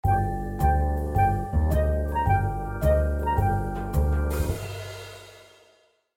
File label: Music Imperial stinger